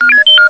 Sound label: star wars r2d2